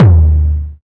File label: big room tom one shot d sharp key; tom drum